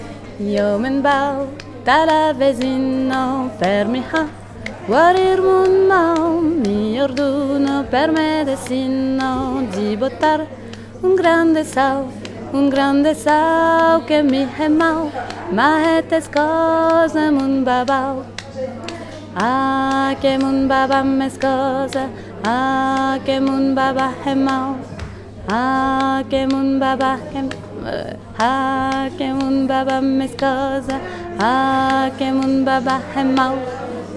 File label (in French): Voix basse; La Vesina